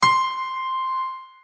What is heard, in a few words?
C6 piano; notes